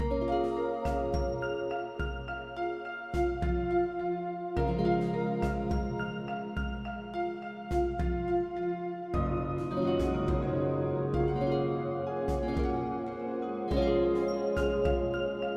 wilderness intense grass, music

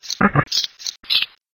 monster spider queen

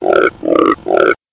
monster frog

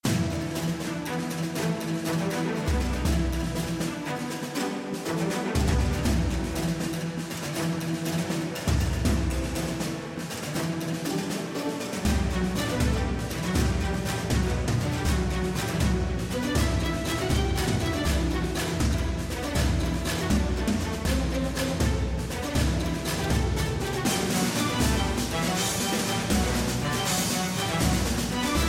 misc pirate

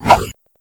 miss

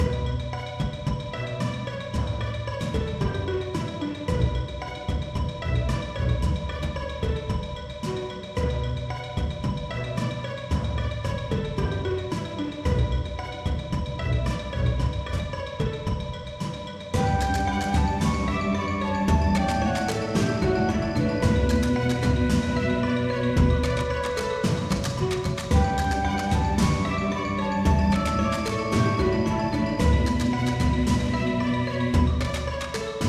wilderness intense fire, music

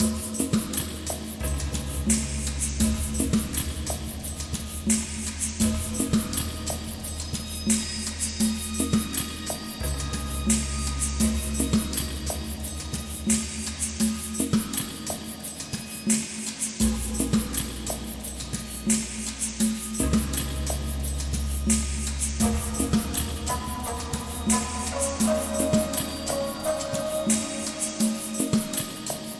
wilderness calm desert

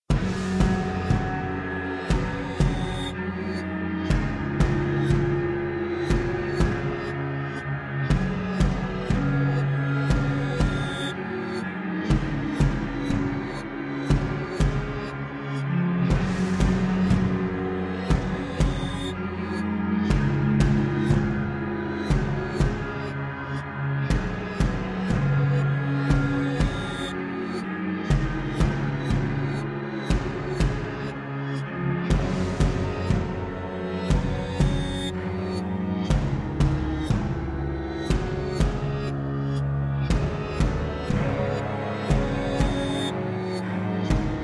wilderness calm hell

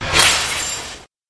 hit wand

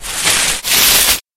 monster trifid

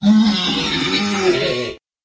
monster hell spawn

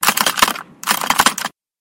monster cockroaches

